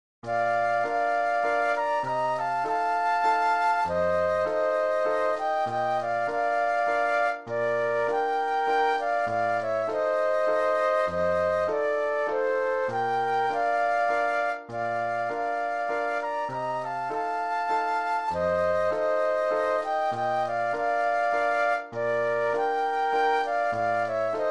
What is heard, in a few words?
Santa Lucia Flute Duet and Piano